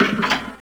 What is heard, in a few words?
locked; Neverhood